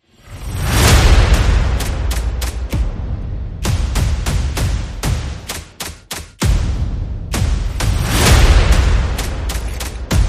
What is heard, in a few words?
Percussion and Effects